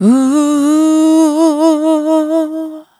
Multi Voice Loops, Vocal Samples; Sonic Foundry (Sony Creative Software) - Pocket Diva World Class Vocal Samples

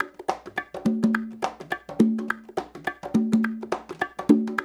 percussion loop; InVision Interactive; Percussion Slam; SAHARA

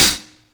Closed Hi-Hat; KJ Sawka; Drum n Bass & Beyond; Drum Tool Box

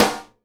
snare GLASS, Live Snares

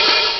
open hihat; ST-XX Amiga Soundtracker Sample Packs

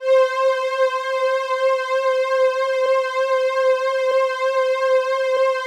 C4, SYNTH WAVES; Music Store Sound Service; Einstein

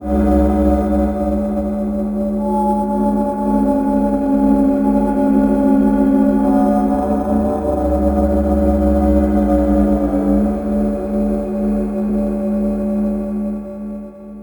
Residual Drone; Sonic Foundry (Sony Creative Software); Loop Noir

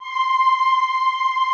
OOH C5, Classical Choir; Best Service; Extended Classical Choir

